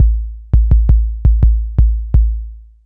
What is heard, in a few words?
Anabeat Kick